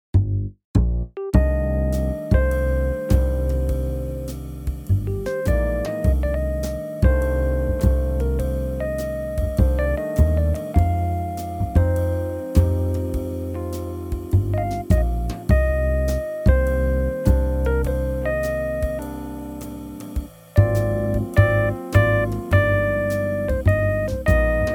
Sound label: Jazz